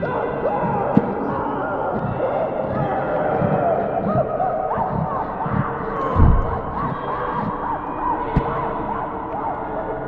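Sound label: Ambience Torture loop; silent hill homecoming 2008 gamerip pc ps3 x360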